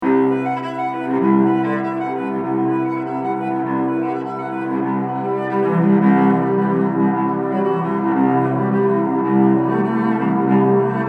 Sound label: Libera me, Cello Solo